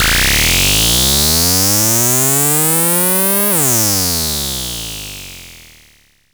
SYNTH FX

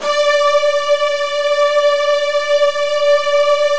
Virtuoso Strings; ILIO; Kirk Hunter Virtuoso Strings VOL-2

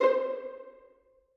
violin piz C5, Strings; M8; BBC

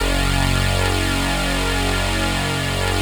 POLY SYNTH; Wizoo - Powered Wave

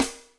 Manycure, Electric Snare, Yamaha DTXpress

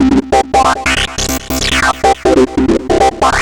philter (seq); Sonic Foundry (Sony Creative Software); Ugly Remnants VOL-2

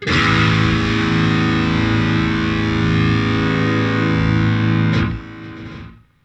Funk Guitar; 90 s Sample CDs; Zero G

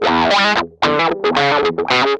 GUI, LICKS; 110 LICKS

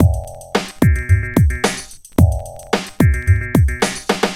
Eat ya beats (110), breaks breakcore

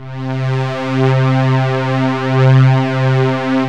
STRING, Techno Trance; E-MU Formula 4000 Series Vol. 2

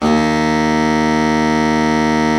BARITON, Baritone sax; Best Service; Killer Horns

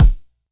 Pound Heavyweight, Kicks; Airwindows

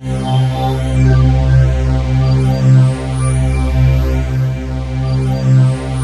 C3, Synth Line

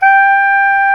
SAX SOP MP G, Soprano Sh

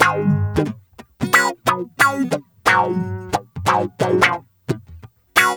GUITAR, Funky Ass Loops; East West

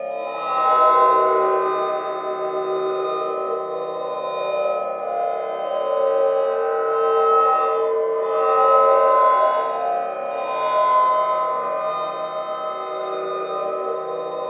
CLANGORE; Distored Reality

